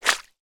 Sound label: comedy squelch wet; sound effects one